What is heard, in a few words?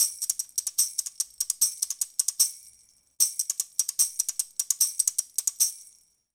Bhangra Tambourine, 150